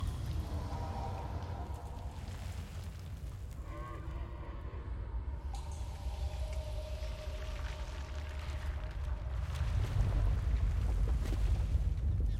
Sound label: Amb SFX